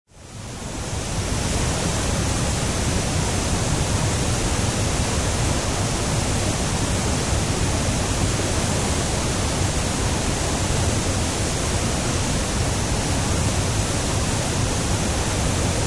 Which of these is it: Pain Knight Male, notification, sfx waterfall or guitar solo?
sfx waterfall